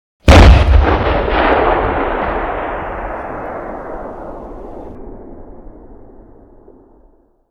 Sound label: Vehicle Weapons; Red Orchestra Ostfront 41-45